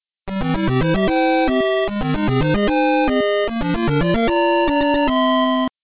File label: level; cstrike; deathrun taringacs 4games